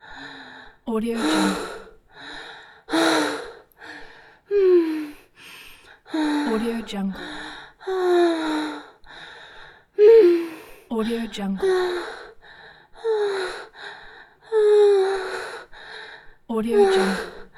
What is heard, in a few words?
female moaning